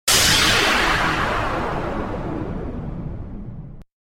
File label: whoosh